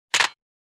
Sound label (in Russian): phone